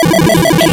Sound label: retro synth beeps fast